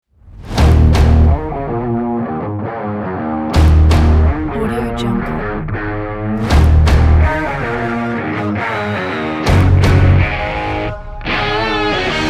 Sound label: energetic stylish rock sports